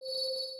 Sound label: Chime; camtasia; MenuMaker; Abstract Prism